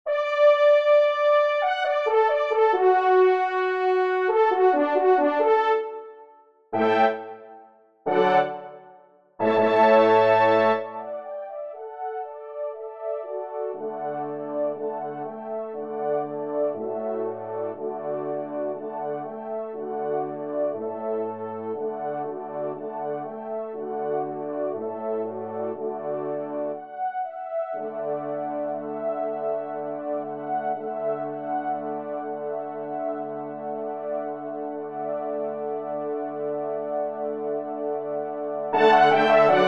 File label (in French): Polka des Cloches, trompe; J. Amelin